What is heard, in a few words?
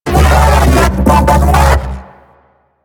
Mech Ambient Vocal; Warframe; DE; Vehicles